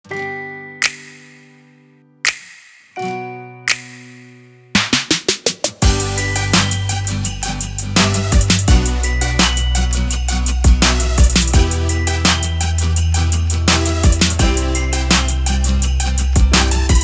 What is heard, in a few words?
INSTRUMENTAL